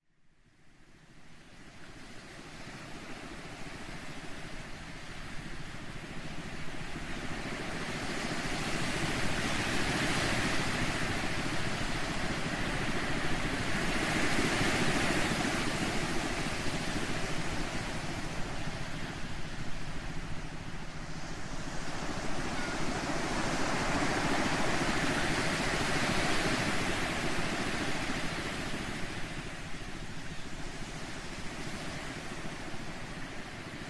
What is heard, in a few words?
Whale Music